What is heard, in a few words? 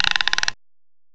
GUIRO; M8; CMI Factory